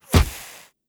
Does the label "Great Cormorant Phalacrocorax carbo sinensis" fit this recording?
No